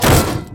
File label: metal damage; Bad Piggies